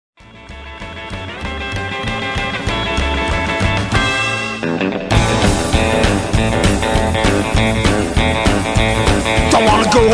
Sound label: Guitar Breaker